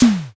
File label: pistol